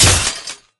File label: attack; endless world idle rpg gamerip 2019